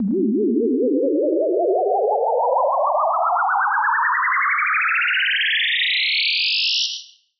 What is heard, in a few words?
Sine Riser 140 BPM; FX; Cymatics; Light Free Sample Pack; Bonus Samples